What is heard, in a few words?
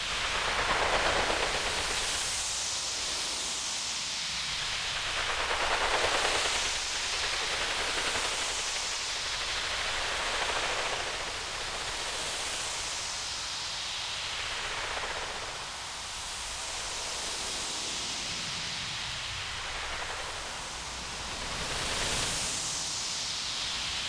rustle seamless